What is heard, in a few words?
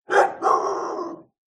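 Huge Dog Rottweiler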